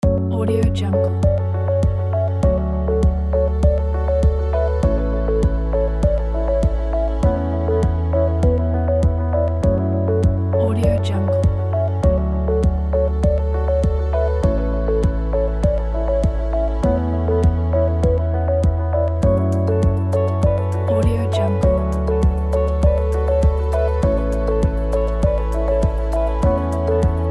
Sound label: ambient inspirational loop